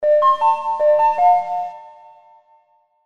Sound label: new chat; liveagent